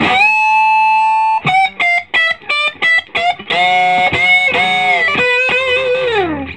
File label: SOLO, Vintage Blues Guitar; AKAI; USB Soundscan vol.22; SOLO B060